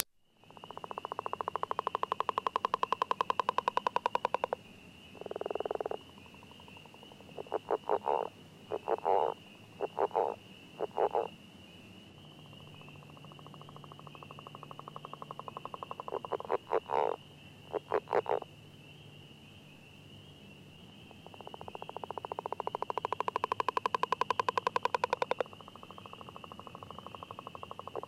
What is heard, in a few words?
Northern Leopard Frog Rana Pipiens